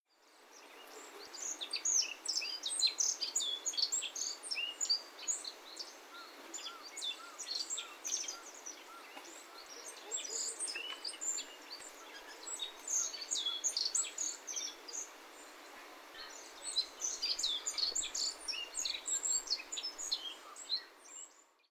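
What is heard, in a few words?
Black-faced Bunting; aoji; 見沼自然公園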